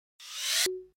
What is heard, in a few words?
Fold open; Nokia N76